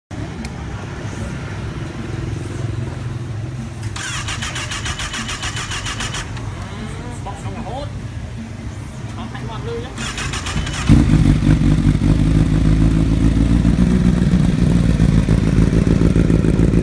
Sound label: cbr 100; موتور